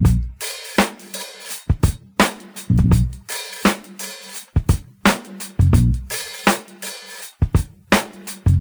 84 bpm breakbeat sample A sharp key, kick tuned to the A# note; drum loops